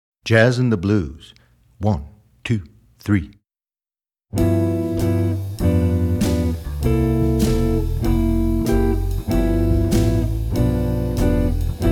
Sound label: Jazzin The Blues